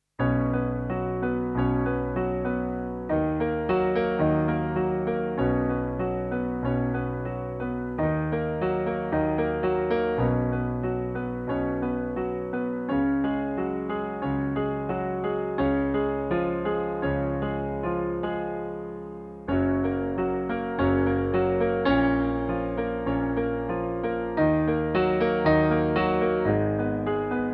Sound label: piano